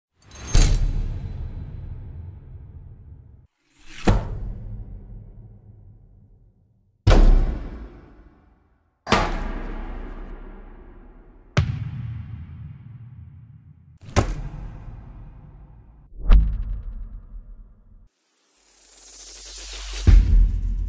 hard hit

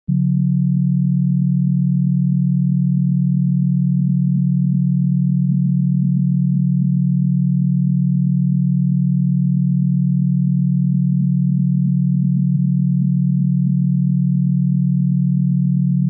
Binaural waves

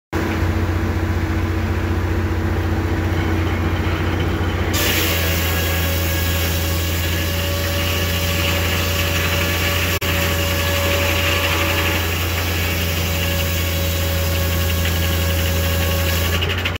sawmill; کارخانه چوب بری

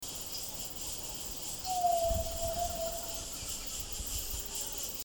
Yellow legged tinamou

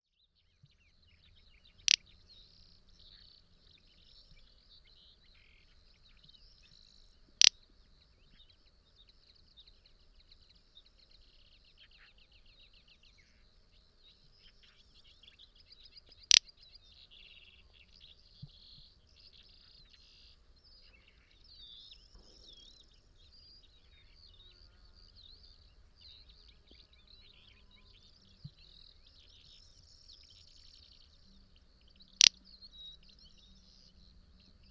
Little-bustard, پرنده زنگوله بال; صدای پرنده زنگوله بال

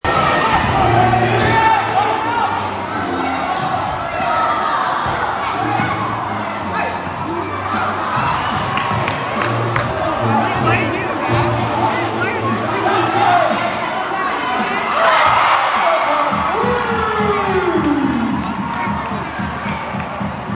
basketball game; بسکتبال

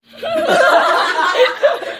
Students Laughing Sound Notification

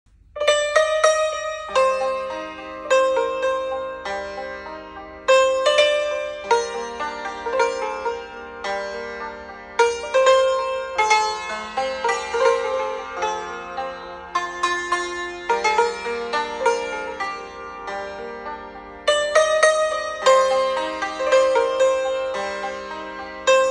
Shahtoor

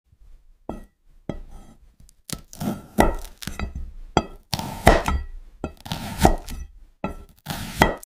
cleaver

